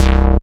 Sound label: Synth Bass; Vengeance Samples; VEE Synth Shots